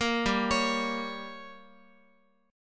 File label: G Diminished, Guitar; arpeggiated; JGuitar